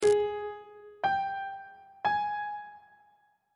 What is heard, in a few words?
a flat 8th interval pitches; on treble clef